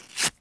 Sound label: office